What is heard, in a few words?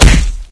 foot hit, vort; svencoop; hl2